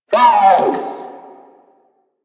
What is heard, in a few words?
Repair Drone Death; Warframe; Necramech Repair Drone